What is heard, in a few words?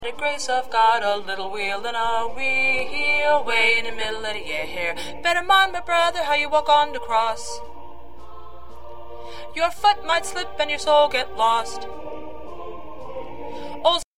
Solo Tenor